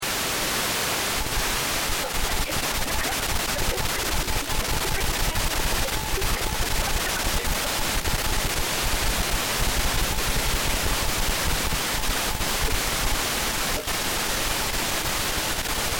TV UHF Static and Snow: Television tuning but cannot get; Quasar; Uneven white noise, snow; Television tuning but cannot get a good reception; Static Sound Effects